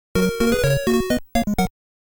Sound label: Round Start; Famicom Sound History Series Mario the Music